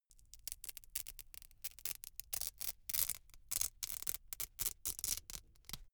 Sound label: velcro open cap really slow